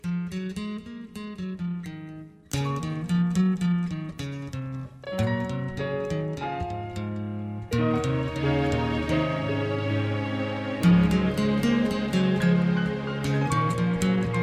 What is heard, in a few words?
Low Key